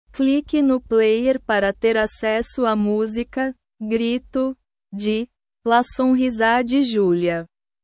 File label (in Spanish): grito